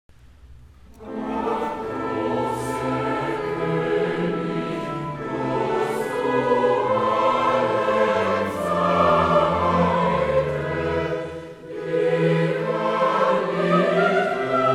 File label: choral; BWV 245